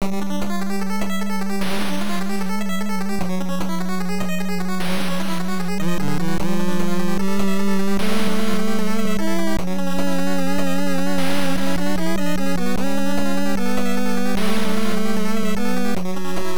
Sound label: Cave; Willow; NES